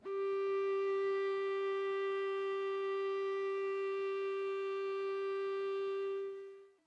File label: violin clarinet; interpolator